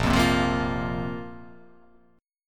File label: A sharp Suspended 2nd down; strummed; A#sus2; JGuitar